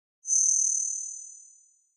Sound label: HF Pulse Reverb